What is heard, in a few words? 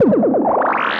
Spin; ItsComingHome